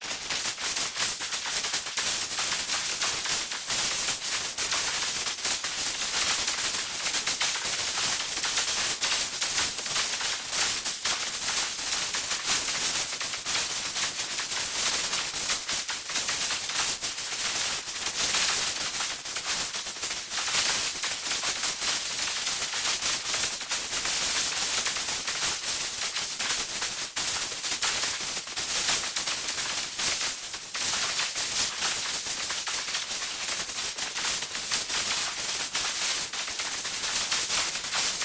magic energy texture complex particles zap sparks short circuit high sweetener; sonniss gdc